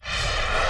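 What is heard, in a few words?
player force blast start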